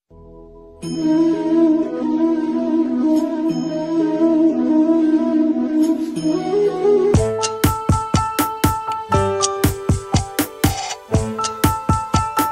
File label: basuri Ringtone, Krishna Flute, bhakti ringtone